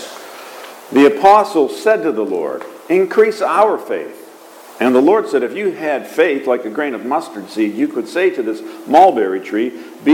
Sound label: Gospel